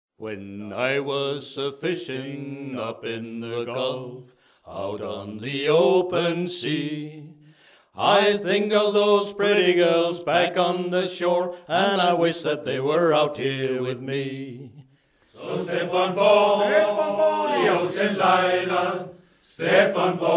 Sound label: Ocean Liner